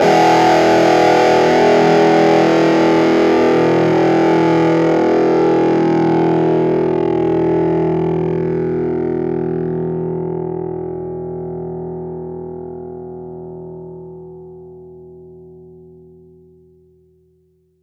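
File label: plucked E major; Polyend; Simulacrum Sounds; 1 Shots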